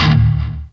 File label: Hard Bass